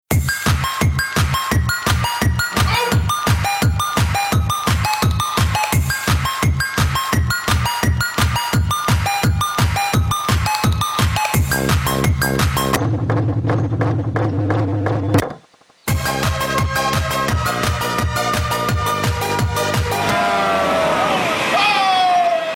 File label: Ringtone